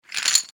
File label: Sports Poker Chips Slide Place Bet Clay Professional; Casino